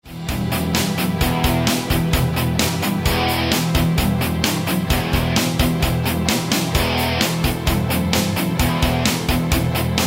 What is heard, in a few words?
metal